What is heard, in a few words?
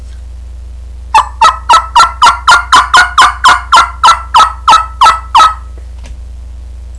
lost yelp, turkey